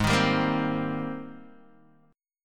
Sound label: Ab Major 9th Ab Guitar DADGAD